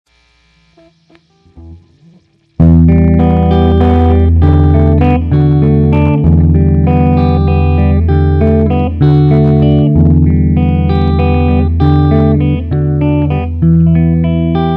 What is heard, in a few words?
instrumental